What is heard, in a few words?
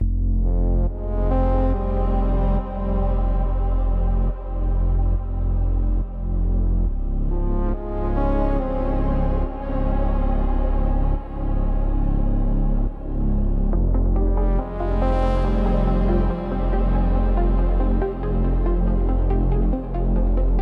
music